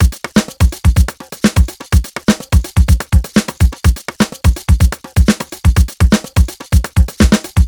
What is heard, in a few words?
full, Beats, Nu Jazz House, 125; PowerFX